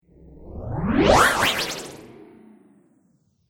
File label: Spinning Speed Up Motion Sound, whoosh